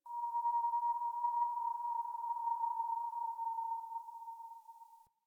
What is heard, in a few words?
Venus Landscape Distant Corpus Texture, Ambience; Warframe; Venus Open World